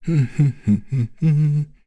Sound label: Vox Hum; Dakaris; kingsraid